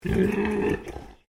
Animal Pig Landrace Inside Grunt

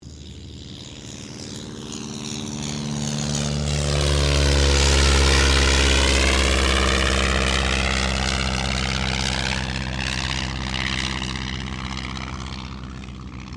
AIRCRAFT AUSTER III TAKEOFF; Airplanes

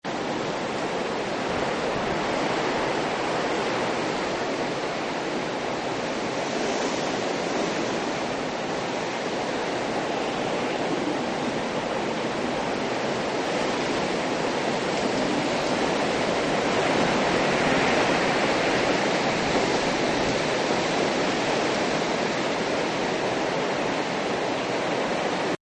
Heavy Wind; Weather

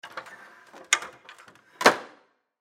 Ladder Close Aluminum; Machinery (Sound Effects:Machines); Blastwave

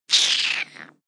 Crinkle, Sucking teeth, FX from human voice; Tech Sound Effects

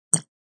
Digital Splat; Splats

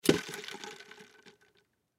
Cup Set Down, Cup Set Down Slosh Styrofoam Ice; Foley; Blastwave

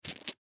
Healing Crystals In Bag Set Down; Set Downs; Objects; Blastwave; Foley

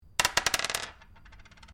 Dice Medium Pair Glass Table Throw; Variety of dice sounds; Casinos

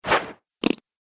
Custard Pie Splat Throw; Cartoon Sound Design Sound Effects

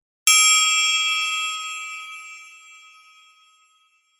Single bell ring with long sustain; Bells (Sound Effects:Musical)

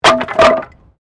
Wood Fall

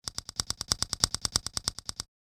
A Series Of Clicks

